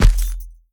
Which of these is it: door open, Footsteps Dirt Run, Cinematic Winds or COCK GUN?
Footsteps Dirt Run